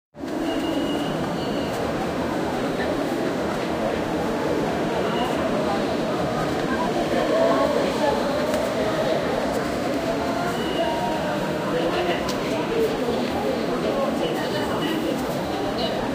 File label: ambient sounds of a, bustle